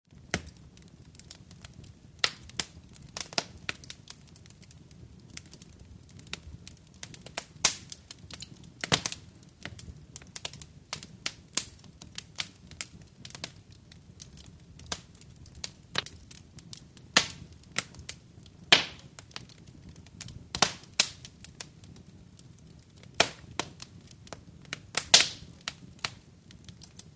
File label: Crackl Fireplace Dry